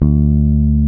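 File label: D2 FINGER BASS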